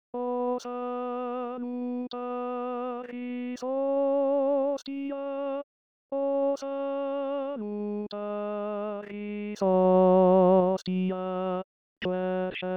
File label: Tenors; Liszt O Salutaris